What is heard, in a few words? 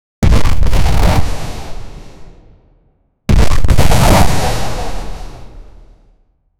a brutal stabbing sound